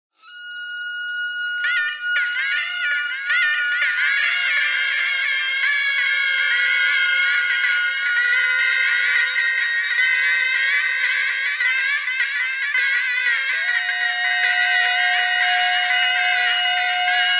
bagpipe geese bagpipe thread noise

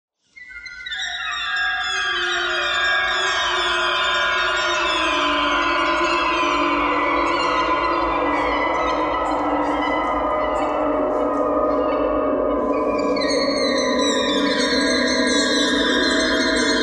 clarinet clarinet thread noise; clarinet geese

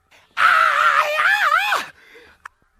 aaahyeaaheeah

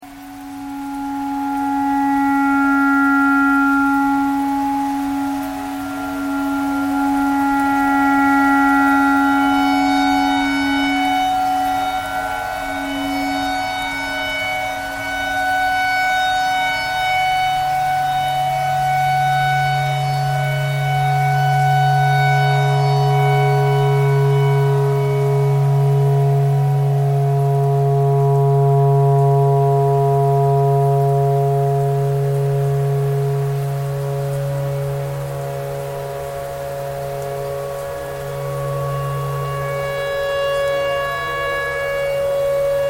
clarinet tornado siren thread pentatonic

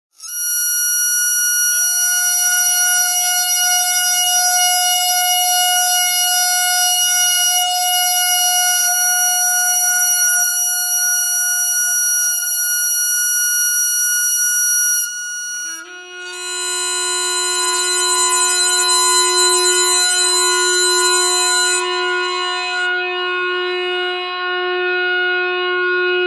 bagpipe thread noise

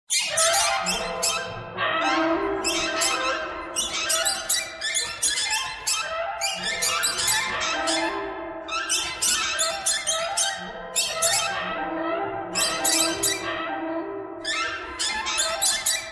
birds

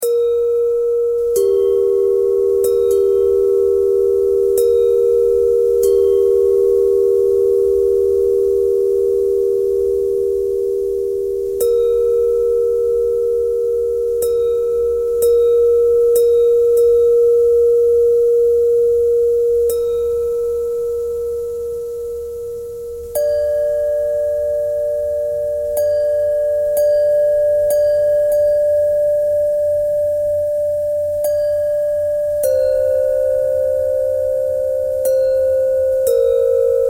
bell thread pentatonic; bells

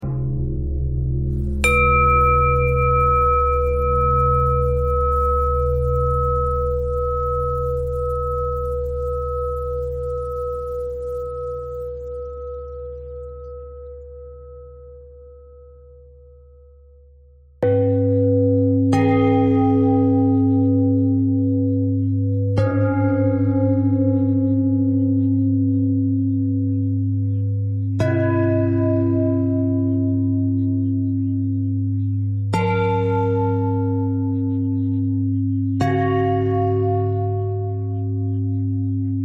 bell threads

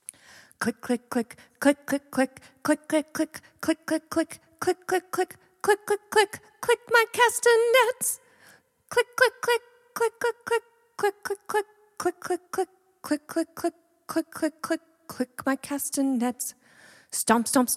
clicking my castanet scale

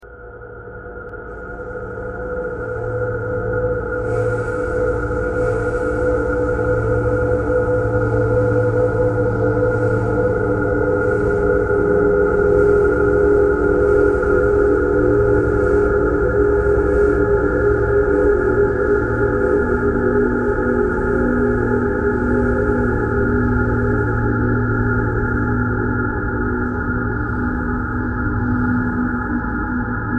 thread noise

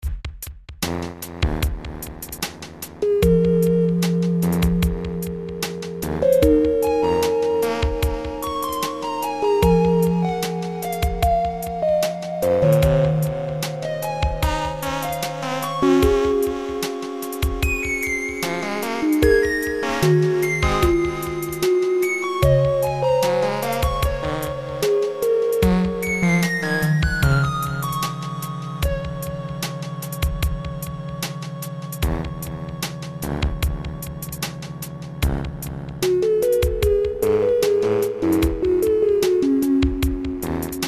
Pukiter Sustain

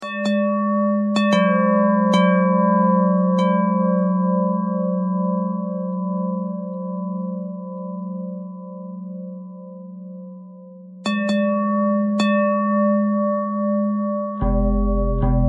bell thread pentatonic

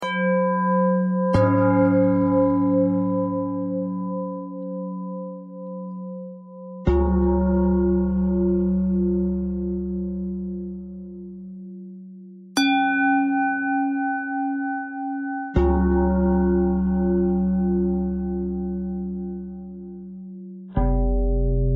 bell bell thread simple, bells

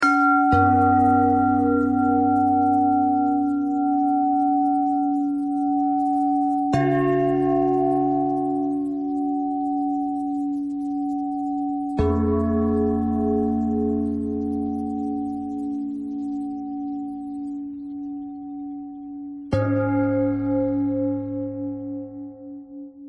bell bell thread noise

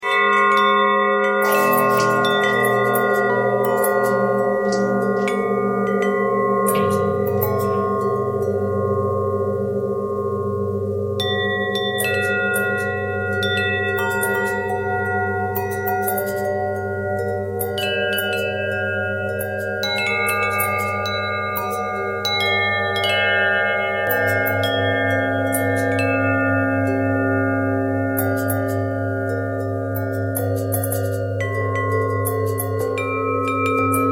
longbell bell bendtwist

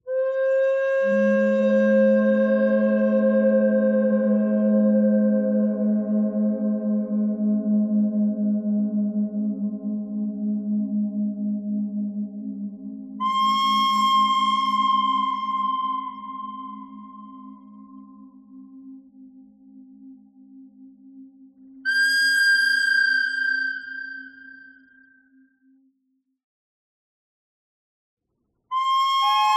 bird thread simple; noreverb

